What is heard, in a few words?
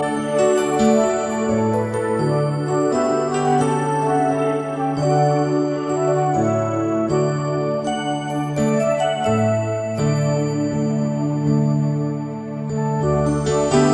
Instrumental